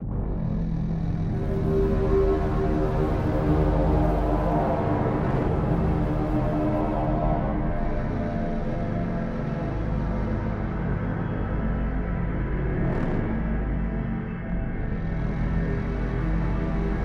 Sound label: tanker rumpel creep, music; c17